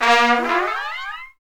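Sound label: brass trumpet; Roland L-CDX-03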